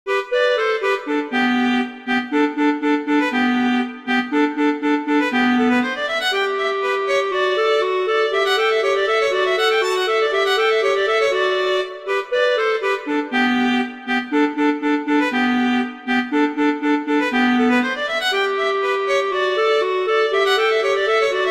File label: Duet; Mozart; Duets